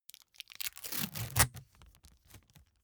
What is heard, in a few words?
package tape dispense